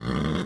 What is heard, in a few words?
Horse Fort Vox; Civ4 warlords